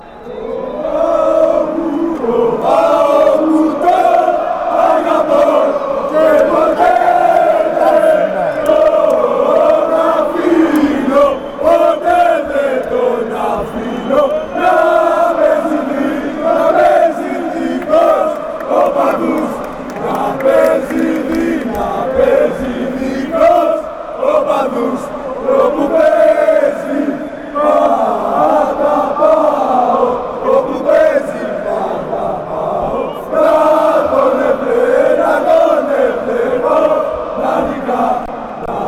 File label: i love my pao, chants